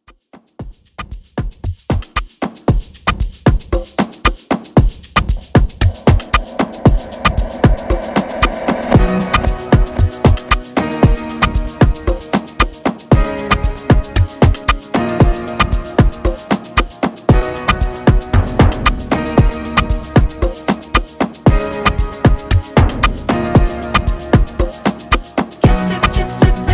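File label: Amapiano Redrum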